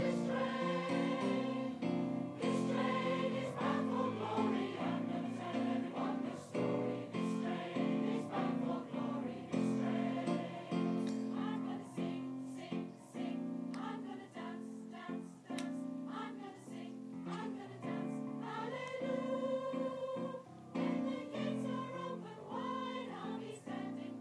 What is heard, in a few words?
the cbso community choir performing in cotteridge park; Art Soak